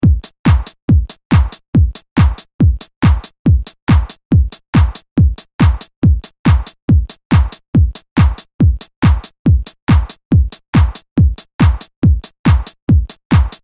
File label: dance drum pattern